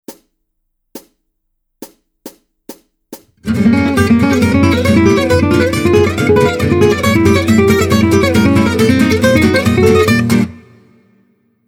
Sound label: lick, Guitare; MusicRadarFrance; Pedago special Django